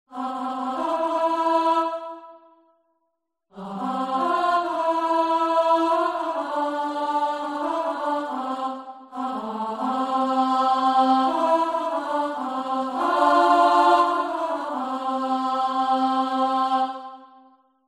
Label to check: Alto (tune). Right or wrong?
Right